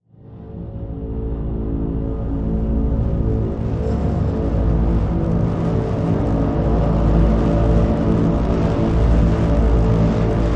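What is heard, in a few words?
Drone Agoraphobic A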